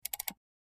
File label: Highpitched Mouse, mouse